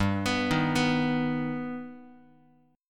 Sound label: G Augmented G Guitar; G+ arpeggiated; x,3,2,1,3,x; JGuitar